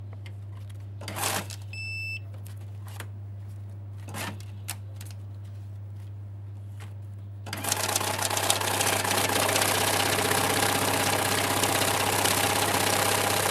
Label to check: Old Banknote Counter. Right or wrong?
Right